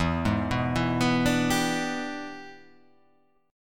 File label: E 7th Sharp 9th, chord